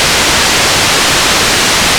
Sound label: noise, analog